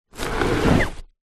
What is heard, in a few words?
household fabric storage box drag on carpet